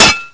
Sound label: wrench hit; svencoop